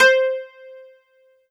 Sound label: Casio CTK-611 Dulcimer C5